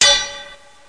AR MET; PC Action 1999 February (Bonus); Dark; thief

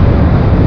truck fast; SpecOps2 MPlayer Demo

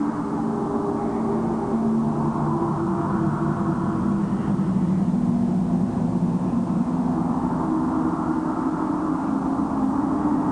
LOOP; Renaissance

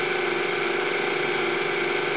wheel

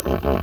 africa menu popup

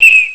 JET; Hanshin Tigers

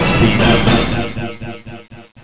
speedup; Woden1.3

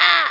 argh; ExChaos

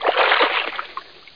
shark up; austrlia

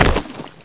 hit ground; Ferazel's Wand Demo

